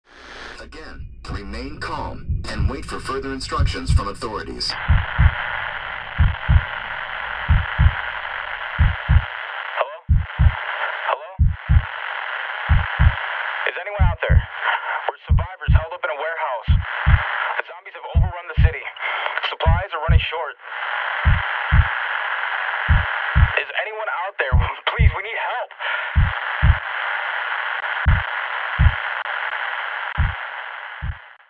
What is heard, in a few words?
radio